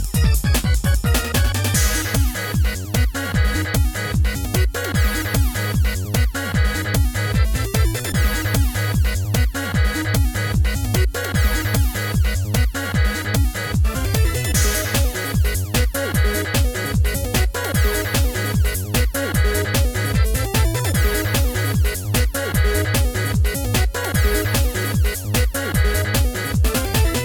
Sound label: Boss Battle; Armored Acorns Action Squirrel Squad